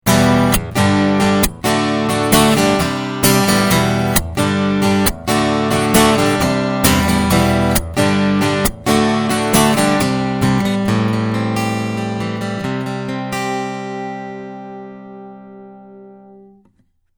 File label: Takamine EQ